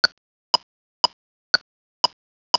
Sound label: korg tm40, metronome